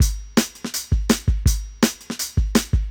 165, Jungle Breaks; 90s Sample CDs; Original Jungle Breaks

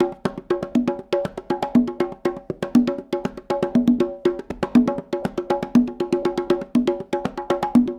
Congas, 70s Analog Funk; Sonic Foundry (Sony Creative Software); What it Is! 70s Analog Funk

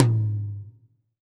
MID TOM, HR-16 Drum Mashines; EdgeSounds

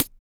SNARE, Male Perc, Vocal; Spectrasonics Vocal Planet; Jazz FX

